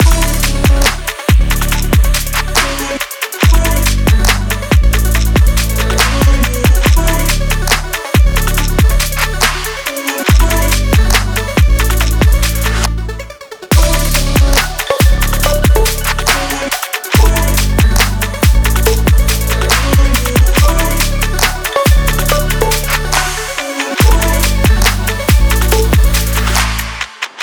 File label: summer travel pop trap